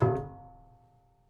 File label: Prepared Piano